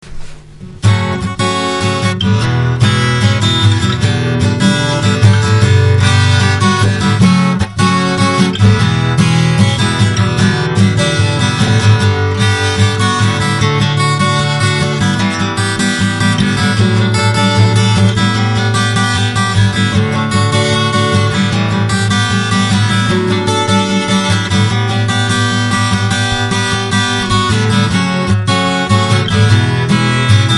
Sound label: Acoustic